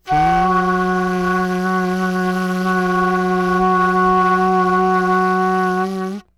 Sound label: FL HUM F#5, FLUTE HUM; Greytsounds - Philip Wolfe David Crozier's Saxophones